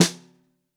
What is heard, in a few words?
SN Bottom, Snare